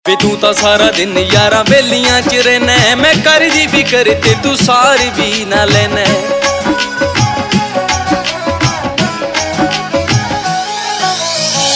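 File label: Ringtone, Punjabi Pop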